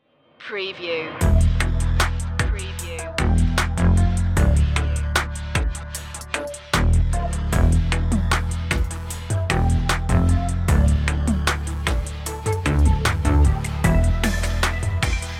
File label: urban jungle